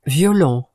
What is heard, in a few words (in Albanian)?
violon